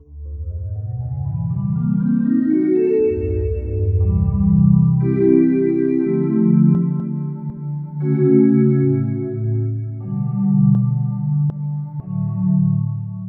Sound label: Bowed Glass; EverQuest